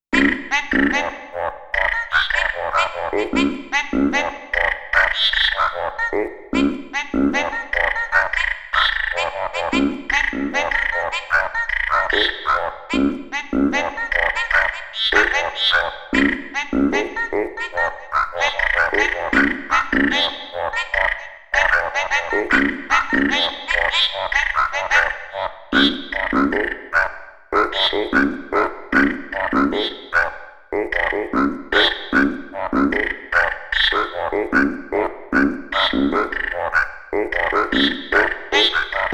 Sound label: Frog Septet